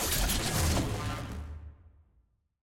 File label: ui map click; jhin